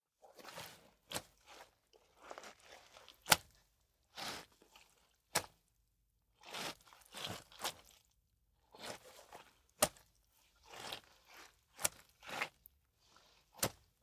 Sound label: Crawling On Puddle; LOOPERS